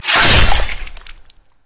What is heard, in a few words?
yasuo base basic attack; LoL